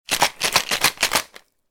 Shake a cocktail shaker

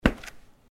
Footsteps Concrete Asphalt Street

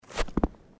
footsteps snow

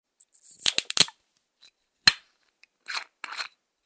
Screw cap

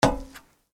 Footsteps Iron plate One

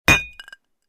Glass with ice Cheers

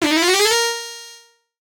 cast spells, magic spell; 魔法/法术的音效

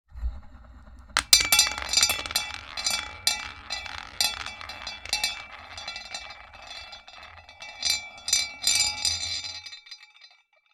The roulette wheel spins double; board game casino mahjong

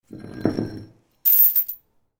Footsteps Chain

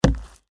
footsteps wood

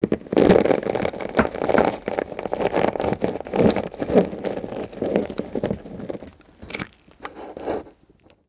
Squeeze a lemon

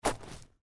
footsteps gravel

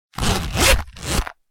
Wallet zipper

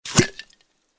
Open the shaker lid

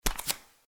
Footsteps Barefoot One

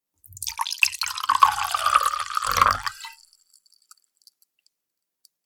Pouring liquor into a wooden cup; alcoholic drink

